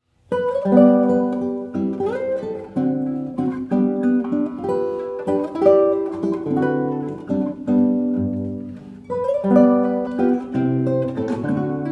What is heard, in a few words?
Prelude 3 in G major; Tárrega